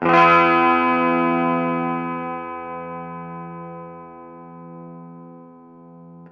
Electric Tube10 Chord short

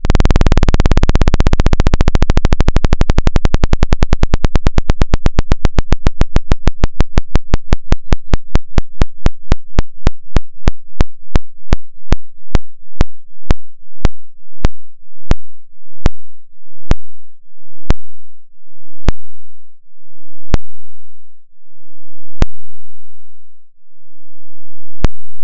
Saw Up

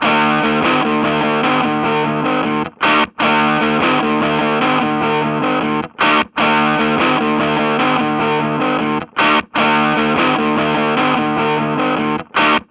Electric Tube10 Riff1

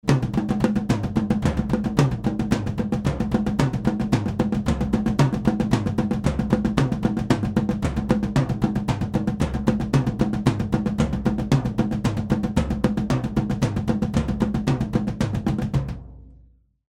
OH Toms

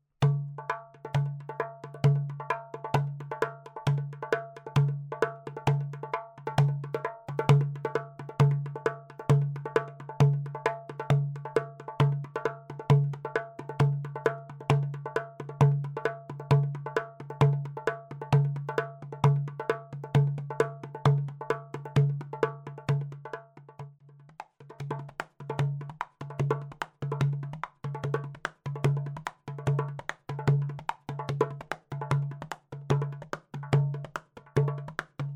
Small Djembe

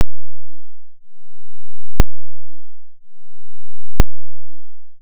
.5Hz Saw Down

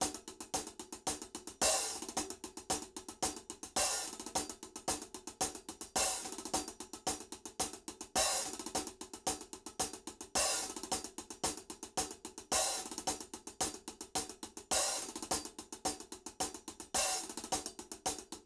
OH HiHat

